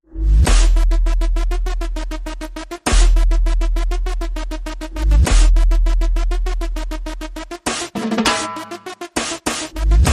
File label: no vocals